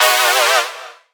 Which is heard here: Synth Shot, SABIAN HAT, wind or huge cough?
Synth Shot